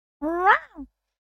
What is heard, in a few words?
cute rawr